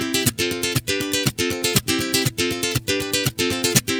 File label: GUITAR SALSA